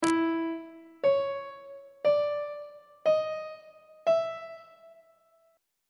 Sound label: d double sharp 7th intervals on treble clef